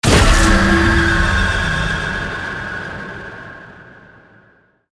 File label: voidling laser start